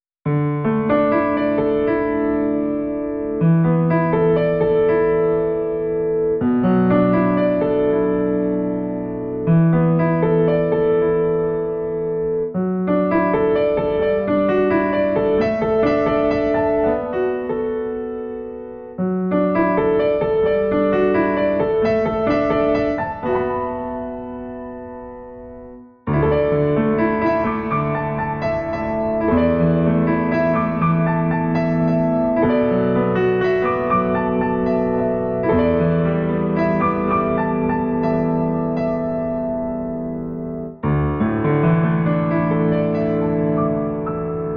Piano B

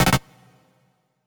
error

